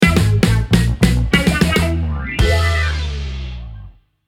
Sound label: Level win; Deadbeat Heroes